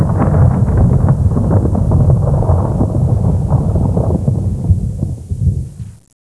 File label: torn thunder high